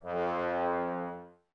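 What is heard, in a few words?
brass trombones F, Woodwind Brass Ensemble; 90 s Sample CDs; Miroslav Vitous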